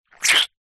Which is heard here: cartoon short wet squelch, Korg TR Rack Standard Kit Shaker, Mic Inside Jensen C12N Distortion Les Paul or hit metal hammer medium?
cartoon short wet squelch